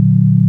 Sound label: sine; ものづくり基礎工学（情報工学分野）; 音の波形と分析